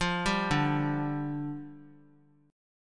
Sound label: D Minor Double Flat 5th, down; Dmbb5; arpeggiated; JGuitar